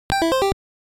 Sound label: Slot Machine - Start